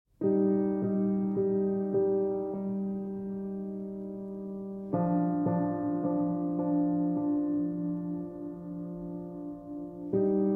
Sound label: Piano